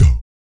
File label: VOC PERC, Vocal Planet Jazz FX BASS PERC